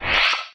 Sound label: shoot; nespa